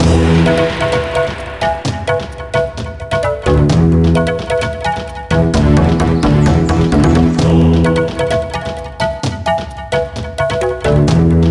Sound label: Jungle Loop; Boulder Dash Rocks; DS